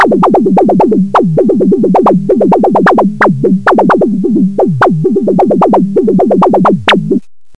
fx phrase, techno riffs; Terra Sound Library

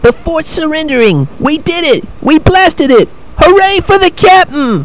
Fort Victory; Business Shareware

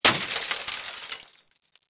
smash; Microsoft Graphics Studio Greetings; Microsoft 1998

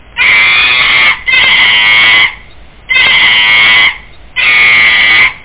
chimp; OctaMED SoundStudio V1; animals

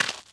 step, Moves; ageofwonder

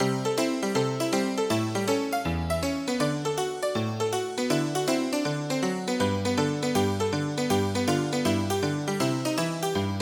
old black piano; SONGTEXTE VOL. 1

